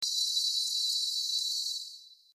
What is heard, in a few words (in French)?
La 440 Hautes Freq